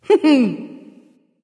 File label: "heh heh"; smite; voicepack; nike